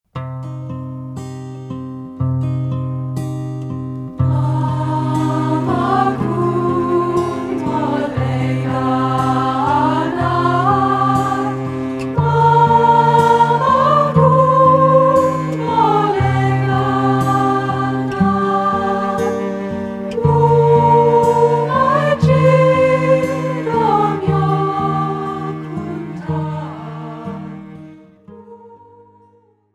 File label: healing